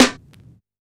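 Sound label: Vinyl Snare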